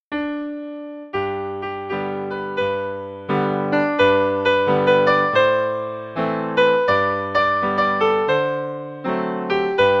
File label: Piano